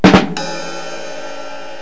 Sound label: rimshot; Monster Media No. 2